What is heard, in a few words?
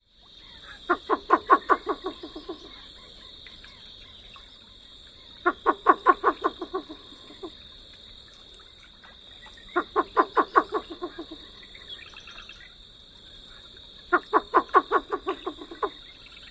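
chuckling call, least bittern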